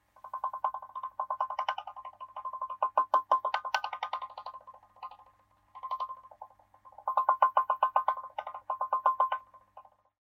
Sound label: Laminator